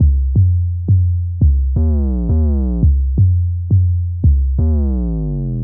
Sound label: Hammer Bass, Drum & Bass; Fluid Dynamics